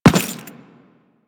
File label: Run Default, Footsteps; Warframe; Teshin